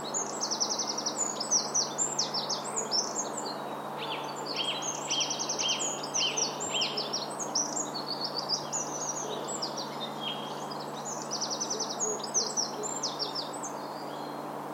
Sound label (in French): troglodyte grive musicienne friche